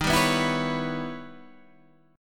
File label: E Minor Major 13th D sharp, guitar